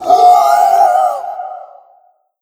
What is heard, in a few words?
hit; sinfar haks; sf effects